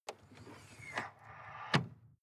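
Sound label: sports car exterior trunk hydraulic; verkehr-transport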